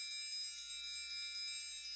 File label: near Win Riser; netent